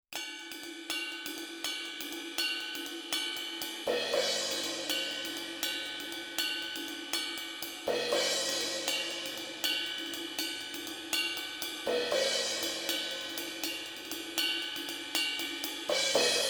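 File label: Class A Transformerless, overhead; UnderToneAudio MPDI-4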